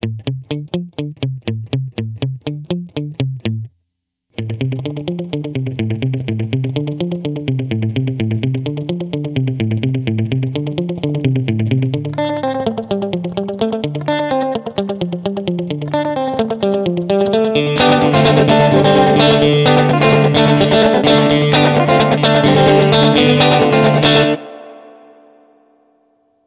slapback delay mono

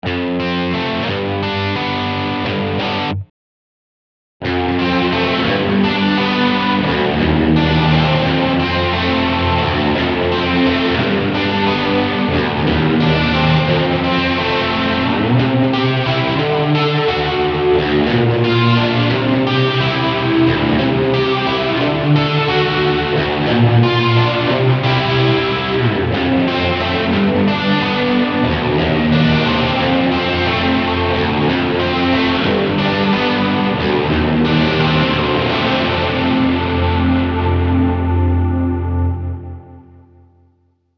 12bit

